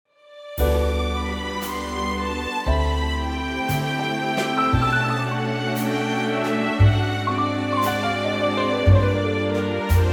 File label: G orchestra